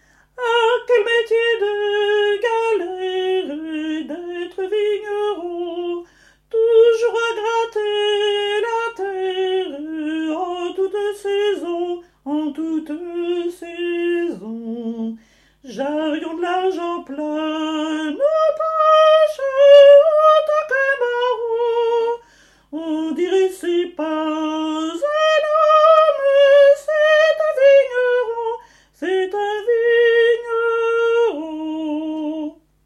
chanson des yapis hommes

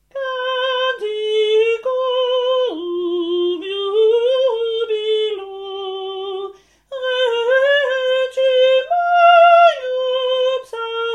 canticorum soprani

